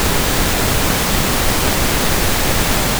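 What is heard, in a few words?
pink noise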